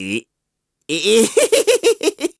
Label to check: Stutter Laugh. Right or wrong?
Right